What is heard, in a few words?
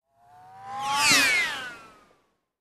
Passing by very fast